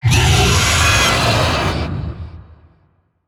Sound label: Vocal Pain; Warframe; Zariman; Void Angel